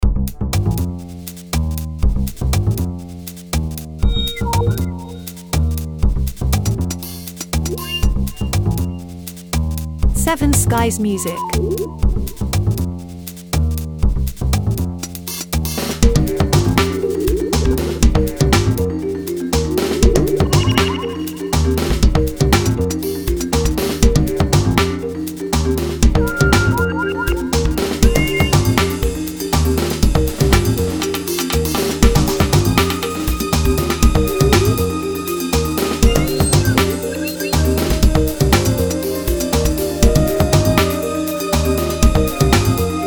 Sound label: Jazz orchestral Calm and Good Mood